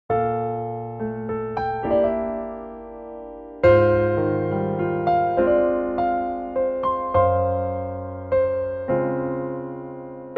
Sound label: Bb piano; key - Bb (ends in F) - vocal range - C to F